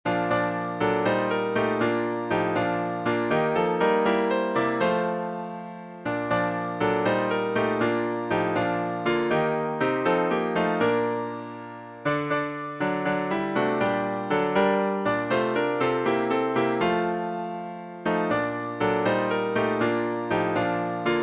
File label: Hymns